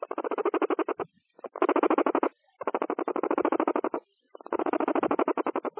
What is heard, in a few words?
bang, cavia